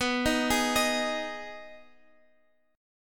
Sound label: Eb Suspended 4th Sharp 5th B